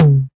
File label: clear tom sample c sharp key, Loudest frequency: 254Hz